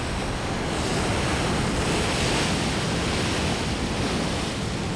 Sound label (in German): schiff welle